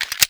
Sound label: desert eagle mag in; weapons